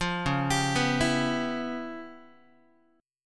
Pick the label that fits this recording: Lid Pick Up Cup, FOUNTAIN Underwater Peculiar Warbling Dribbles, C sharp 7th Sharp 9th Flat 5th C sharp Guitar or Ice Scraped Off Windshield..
C sharp 7th Sharp 9th Flat 5th C sharp Guitar